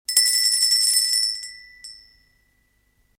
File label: store bell ringing sound